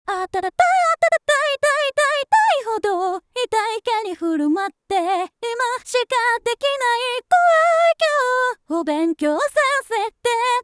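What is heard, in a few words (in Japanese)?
Synthesizer V, サビ, 「アクティブ」を適用した, 歌声; 適用後; ノートパネル; 感情表現がより強調されてサビにインパクトが生まれた; Synthesizer V Studio 2 Pro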